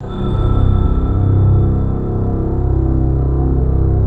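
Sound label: C 1, BELL STRINGS; Best Service; Advanced Media Trax Modern Composer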